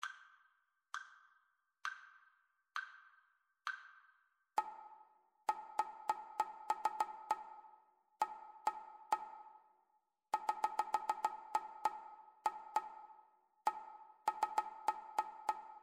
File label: rhythmic dictation; ear training